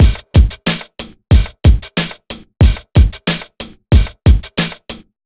92 BEAT, Pure Electro, BEAT MIX; USB Soundscan vol.03; AKAI

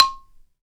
BALA, Balaphone

